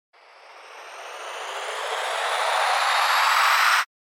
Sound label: RISER